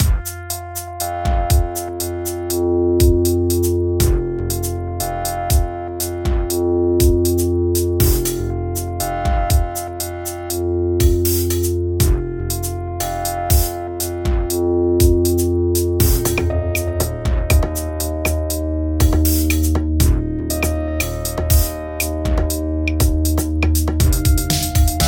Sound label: Resonant; novembeat 2018